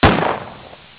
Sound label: 38 revolver shot